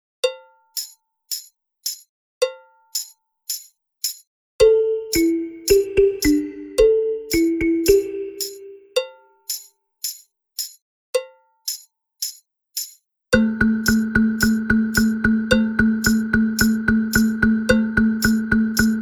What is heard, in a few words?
balafon